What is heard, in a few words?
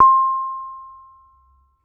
Bonang, Gamelan